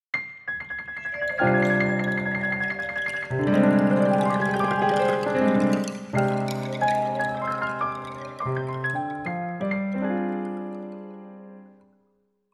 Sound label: piano bambou taps